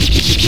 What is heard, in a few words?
scratch; pesterchum